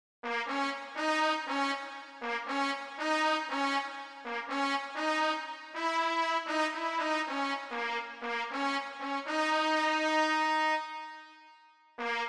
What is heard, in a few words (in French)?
Trompette 2